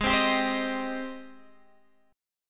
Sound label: G sharp Major 7th, Guitar, Standard; strummed; chord; x,11,10,12,13,11